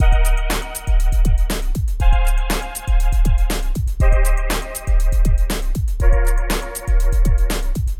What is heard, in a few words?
tech, loops